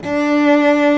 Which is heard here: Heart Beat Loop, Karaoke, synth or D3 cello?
D3 cello